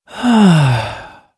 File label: sigh; hl2dm